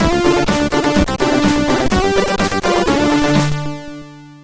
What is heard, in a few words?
sid MOS6581R4; hvsc